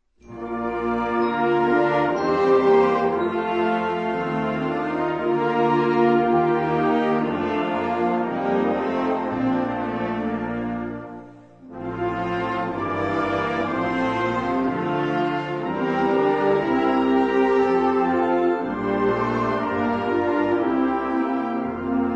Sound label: Clockwork Chorale